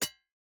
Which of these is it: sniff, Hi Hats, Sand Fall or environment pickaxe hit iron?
environment pickaxe hit iron